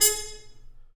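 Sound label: brass trumpet mute stab, Harmon stabs; Harmon Amb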